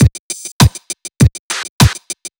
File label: BEAT, funk; USB Soundscan vol.47; AKAI; Hip-Hop & R&B